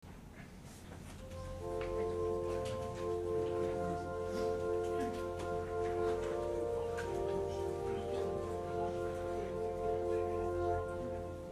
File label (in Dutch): intermezzo orgel en panfluit; Hemelvaartsdienst